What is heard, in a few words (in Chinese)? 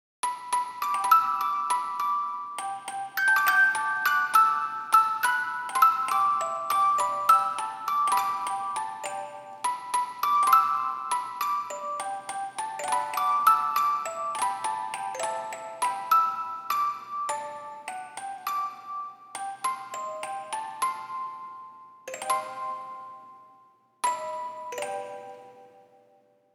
八音盒铃声